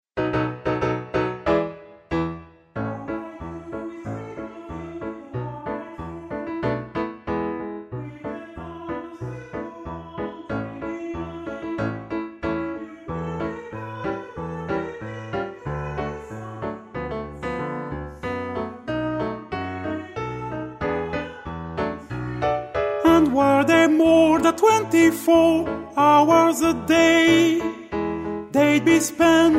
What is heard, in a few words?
SOPRANO; LENT